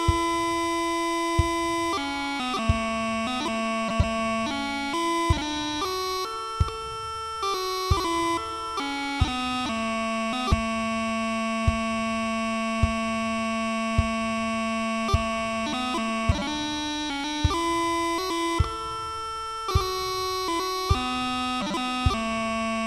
Transition- Endearing Young Charms - Skye Boat, drumming; highlanders